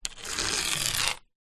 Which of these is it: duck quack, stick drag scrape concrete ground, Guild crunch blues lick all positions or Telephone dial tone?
stick drag scrape concrete ground